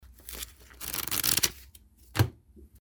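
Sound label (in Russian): пальцем внимательно доллары; Звук купюр, долларов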